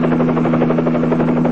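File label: sound of a military helicopter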